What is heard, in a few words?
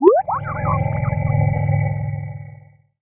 bleep; dark matter mining